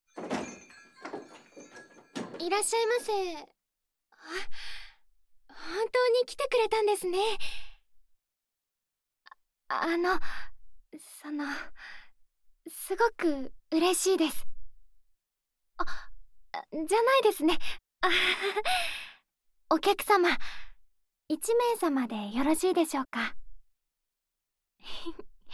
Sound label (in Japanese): 豆挽きASMR; 優しくゆっくり挽きましょう